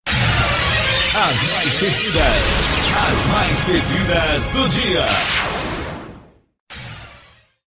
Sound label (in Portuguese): vinheta